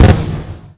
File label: Box Open